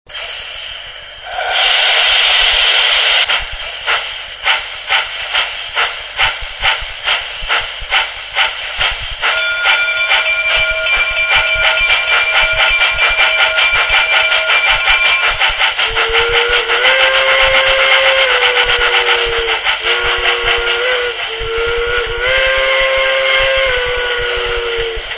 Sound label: shay, loksound